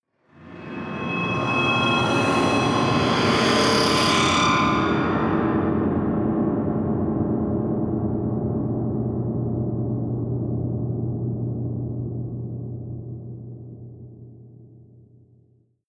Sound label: whoosh scifi scan